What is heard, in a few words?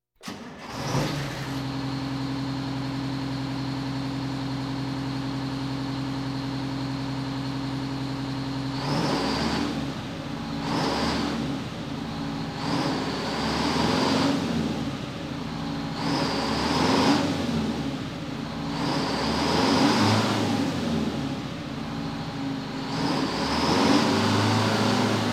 Police, Fire engines